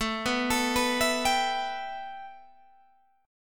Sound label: A 7th Suspended 2nd Guitar Open G; 19,16,19,16,17,17